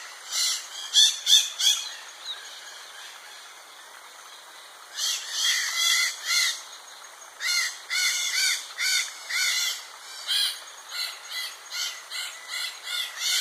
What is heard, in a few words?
red shouldered macaw calls